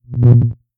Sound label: Woop Transition